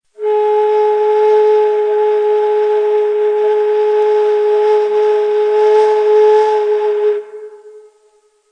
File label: Gevasht, Neysample